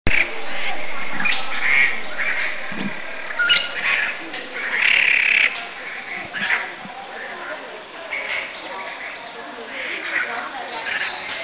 Grey Treepie, Taiwan

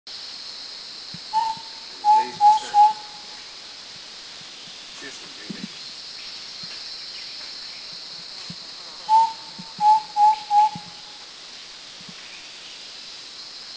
Fluffy-backed Tit Babbler Song; Thailand; Krung Ching

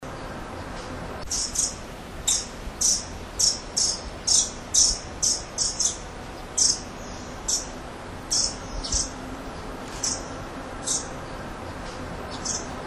Sooty Babbler; Laos; Na Hin; George Wagner

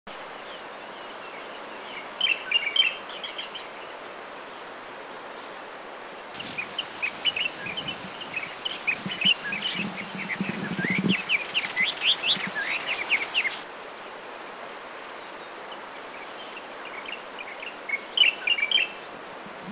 Styans Bulbul, Taiwan

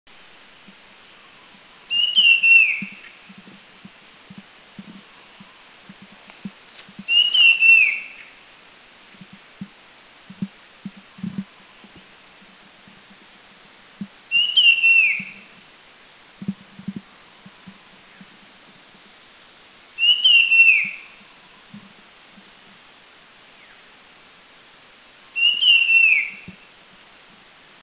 Puff-throated Babbler, Thailand

